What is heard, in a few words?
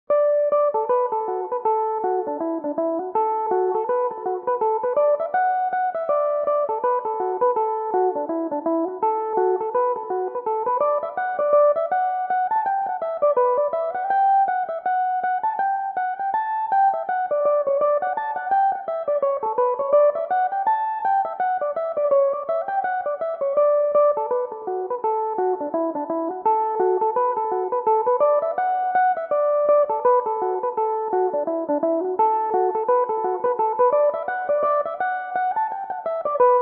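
D major, Irish mandolin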